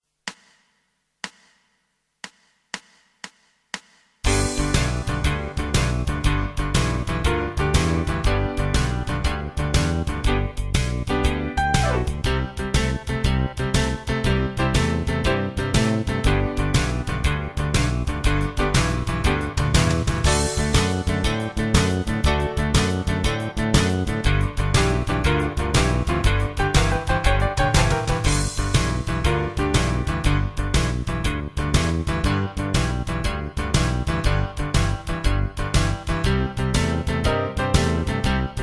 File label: 12 Bar, Guitarbacking